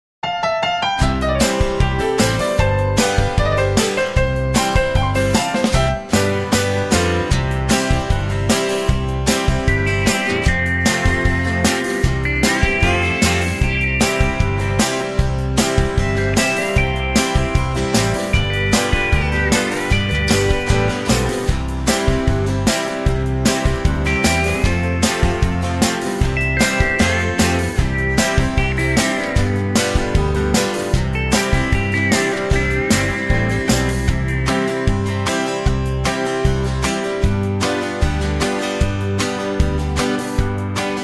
C To D No Backing Vocals, backing tracks

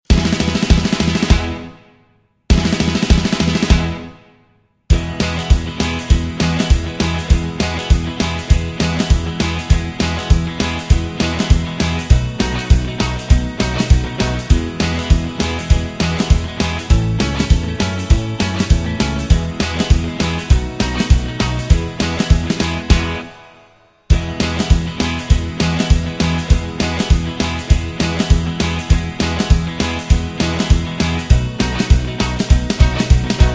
No Lead Guitar

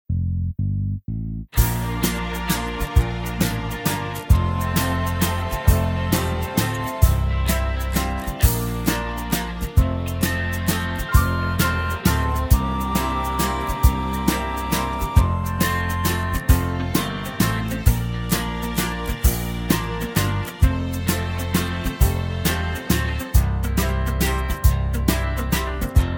No Backing Vocals

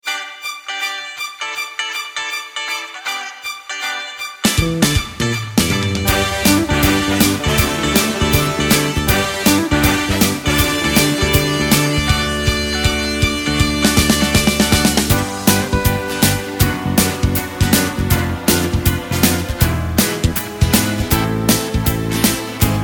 G No Backing Vocals, backing tracks

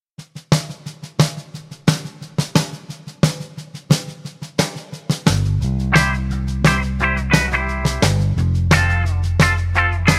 C No Backing Vocals, backing tracks

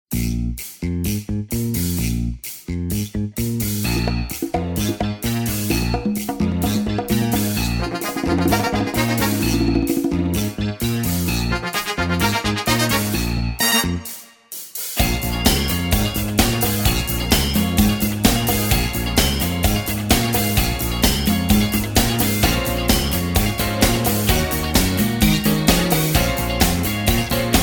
Bbm No Backing Vocals, backing tracks

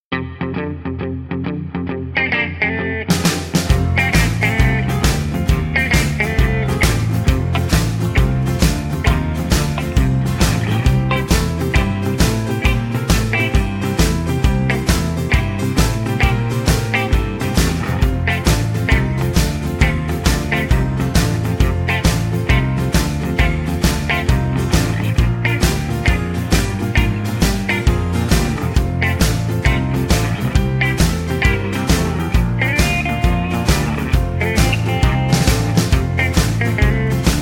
(F) No Backing Vocals

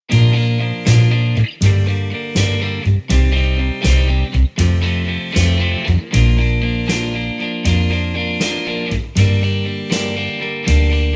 Bb No Backing Vocals, backing tracks

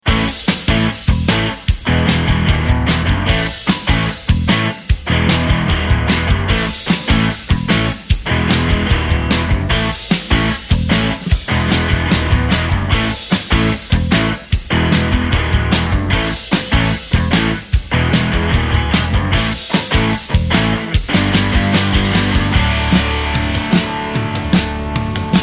A No Backing Vocals, backing tracks

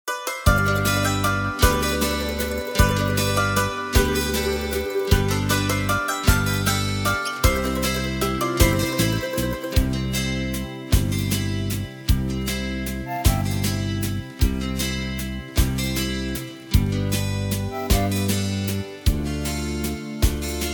(C To Db) No Backing Vocals

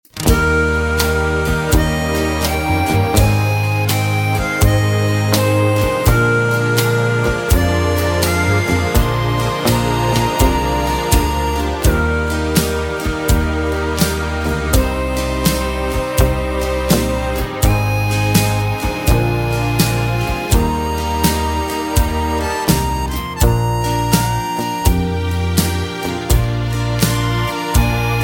Eb No Backing Vocals, backing tracks

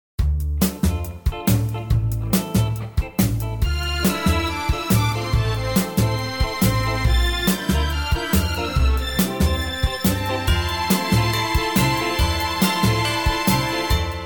F No Backing Vocals, backing tracks

